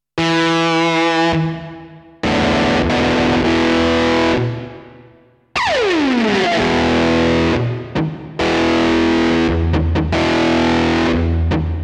Yamaha Genos Super Articulation Distorted Guitar

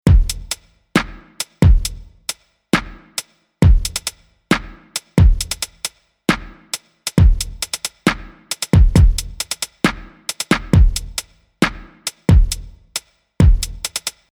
Beat original

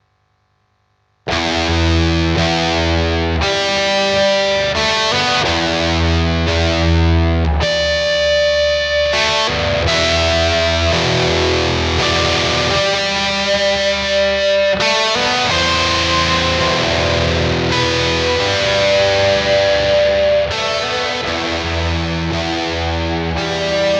High Gain; Neunaber Immerse Reverberator MK II

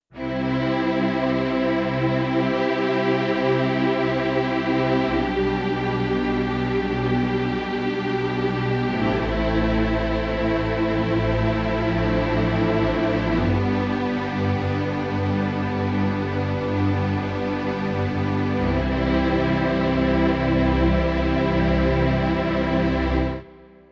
Strings; Magix Acid Pro 10; Vita2

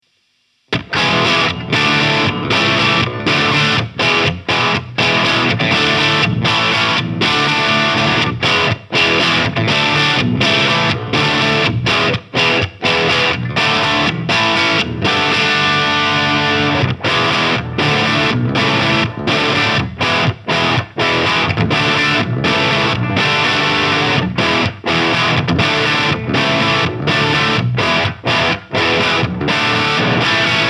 Chapman Guitars ML1 Modern Baritone Crunch

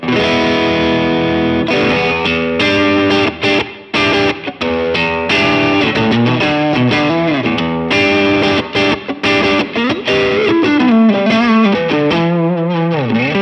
Drive; Harley Benton DNAfx GiT Pro; Combo 30

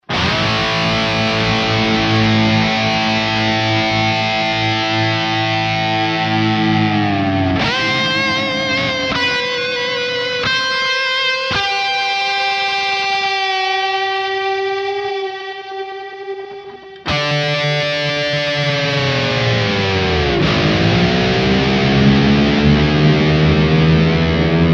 Eventide Ultratap Delay

